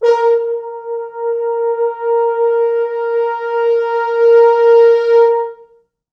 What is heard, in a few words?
4 FrHorns